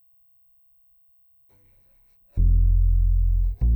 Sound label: C Bass DI